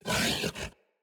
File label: Kubrow Feral Vocal Melee Attack